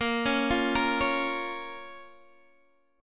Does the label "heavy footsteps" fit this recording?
No